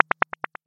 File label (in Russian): knopka cifrovoi odinochnii multyashnii bulkayushii